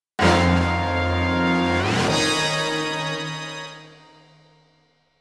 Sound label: Race Start Fanfare; F-Zero; Mario Kart 8 Deluxe; Wii U